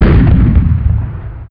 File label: heavy cannon; weapon